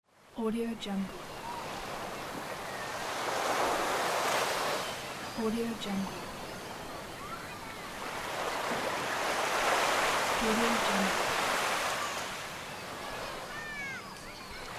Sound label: beach ambience